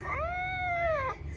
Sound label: meow